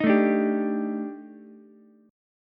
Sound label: Eb Major 7th Flat 5th, Ukulele; EbM7b5; strummed; JGuitar